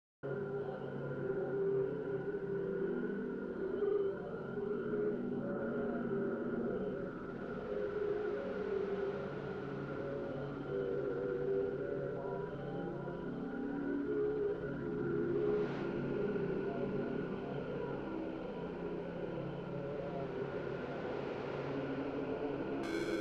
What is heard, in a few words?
noise etude; night shiver